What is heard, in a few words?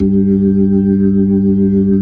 B-3 Jazz G3; Keyboards of The 60's and 70's